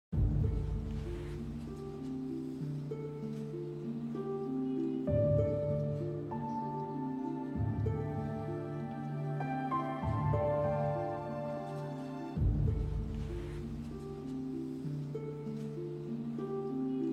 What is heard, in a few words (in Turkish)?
Enstrümantal fon müzik; Kadın